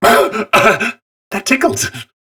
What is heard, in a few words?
Training Dummy Pain; Warframe; Duviri; Target Dummy